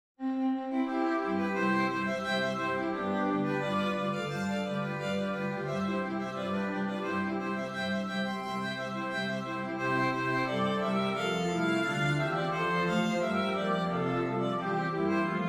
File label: string quartette